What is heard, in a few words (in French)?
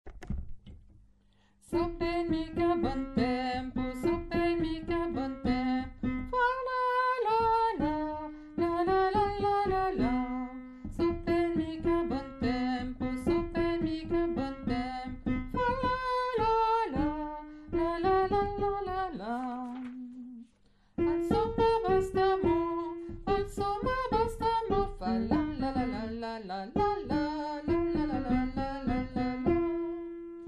basse, chorale